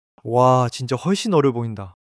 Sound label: laughter